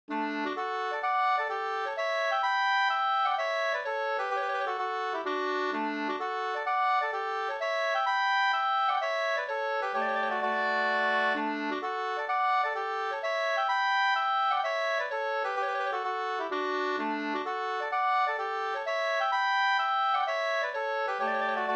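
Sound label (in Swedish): polska, tvåstämmig; Sjalevad; O Nilsson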